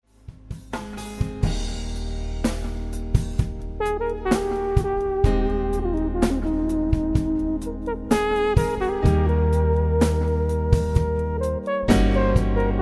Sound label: Crumar Solo; Crumar EVI